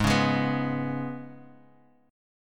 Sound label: G sharp Minor Double Flat 5th G sharp, Guitar Half Step Down; G#mbb5